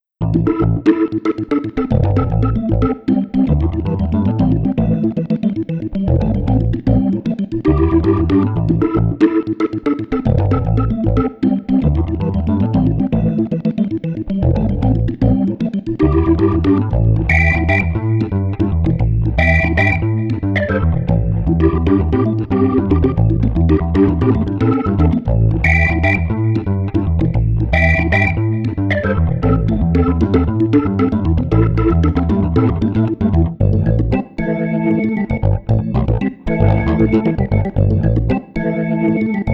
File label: Street Organ Funky Trio no Drums 115